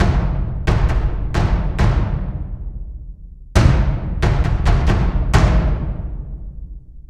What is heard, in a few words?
Epic Cinematic Orchestral; Epic Cinematic Orchestral Pack 2